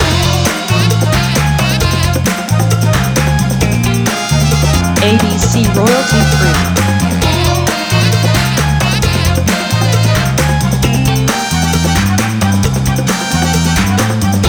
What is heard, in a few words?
Soul Party loop